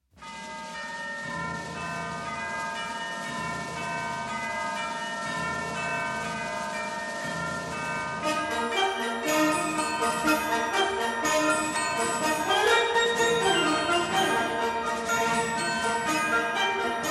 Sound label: The Viennese Musical Clock